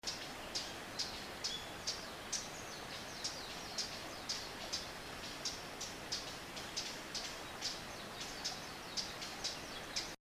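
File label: uguisu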